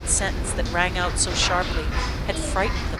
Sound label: noisy